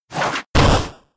Low Sweep

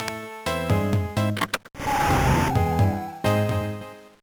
Vroom

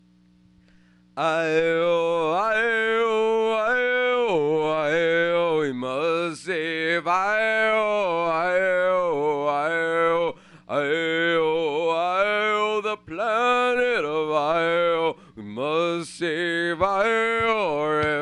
Chant; Rise of Nations: Rise of Legends; Aio

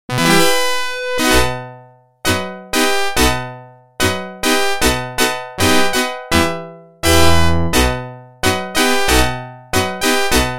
Organ; NHL 94

